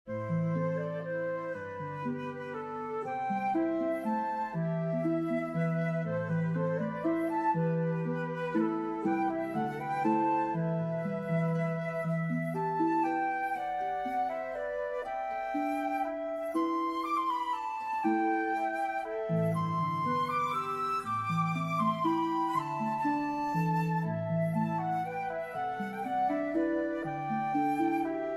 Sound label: robin harp flute